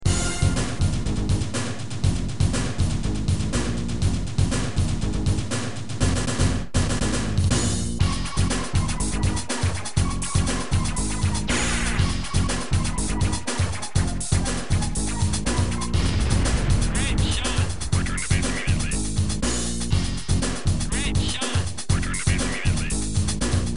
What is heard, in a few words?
WIN; N64 Project